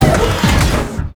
biomech connect weak spot

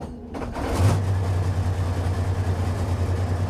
start engine, engines; World of Tanks

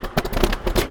engines; World of Tanks

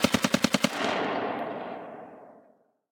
combat atmosphere; World of Tanks

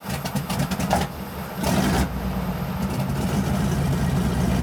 start engine, engines; World of Tanks

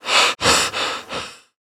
sprint breathing; Call of Duty Modern Warfare

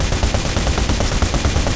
loop flak38; guns; tanks; War Thunder

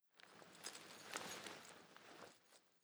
gear movement long slow; Rust

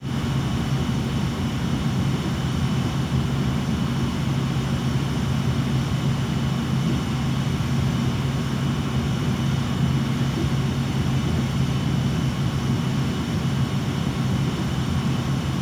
ship engines; World Of Warships

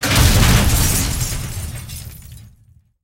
projector crash, tanks

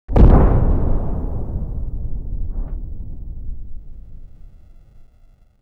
distant explosion

